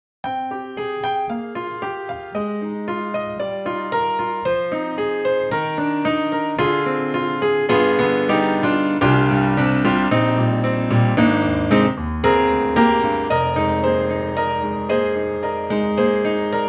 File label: Grand Rhapsody Piano Fat and Punchy; Waves